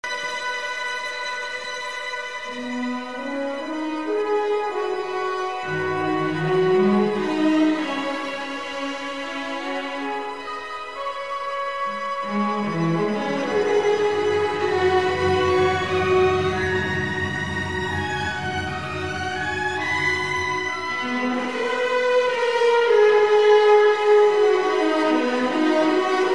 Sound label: viola fantasia